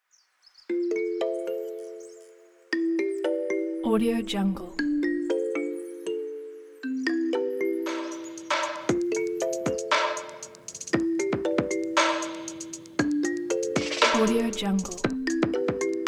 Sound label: modern fresh buildup; trickytwo